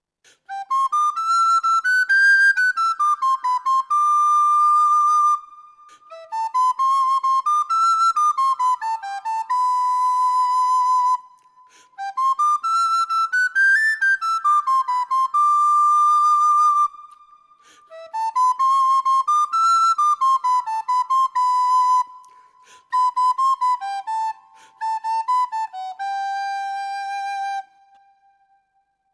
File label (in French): flute a bec